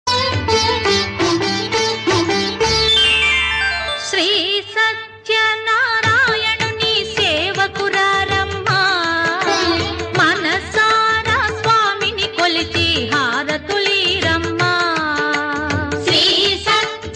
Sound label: devotional telugu song